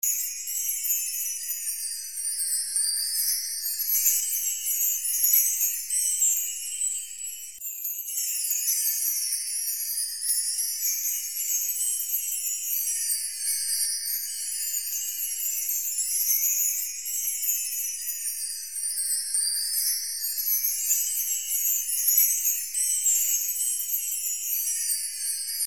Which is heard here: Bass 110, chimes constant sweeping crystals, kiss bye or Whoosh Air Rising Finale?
chimes constant sweeping crystals